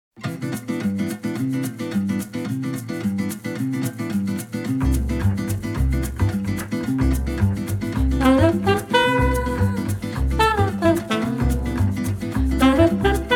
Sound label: Samba